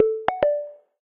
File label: Particle, notifications; MagicOS 7.1